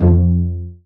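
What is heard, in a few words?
STRING PIZZICATO F1, STRING SECTION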